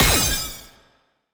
shieldbreak

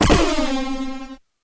zap beam

